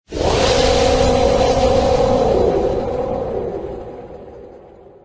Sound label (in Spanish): Grito de Cinderace Gigamax